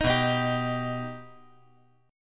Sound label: B Augmented B, chord